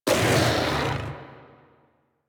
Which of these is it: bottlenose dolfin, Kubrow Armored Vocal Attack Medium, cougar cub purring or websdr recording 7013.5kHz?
Kubrow Armored Vocal Attack Medium